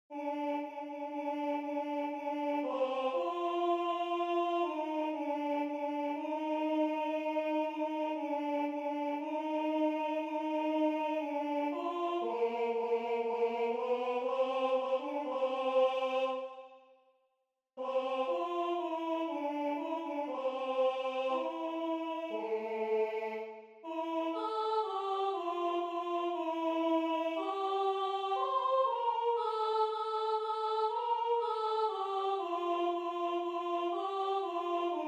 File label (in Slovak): zapad slunce alt